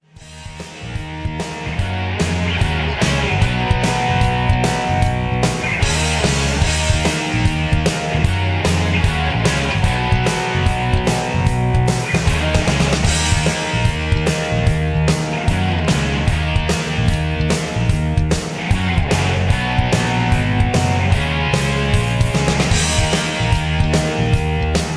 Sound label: Karaoke Mp3 Backing Track